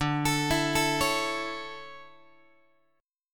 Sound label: D Major 7th Suspended 2nd, Guitar; arpeggiated; JGuitar